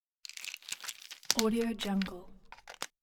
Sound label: pill bottle; moonaudioproduction